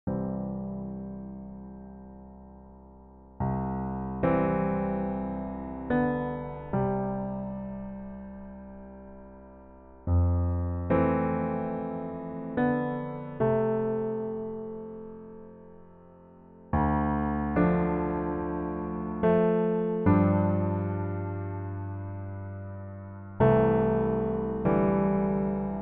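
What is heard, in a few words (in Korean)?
Piano version 남자키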